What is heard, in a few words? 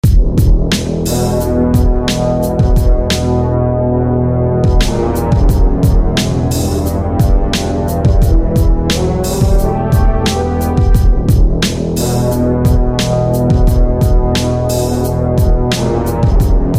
Horn of Evil; SFB FX